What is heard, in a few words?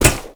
Wall Grab Metal Foley; Warframe; Tenno